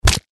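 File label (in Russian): звуки драки людей и удара кулаком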